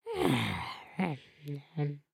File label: Sleep, creatures organic; Lego; Strombie